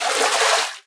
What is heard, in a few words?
water wade, footsteps